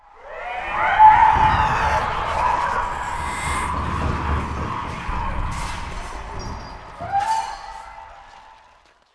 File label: goblin; moria; Gamestar